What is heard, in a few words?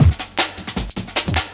Box Break, Loops; m8 breaks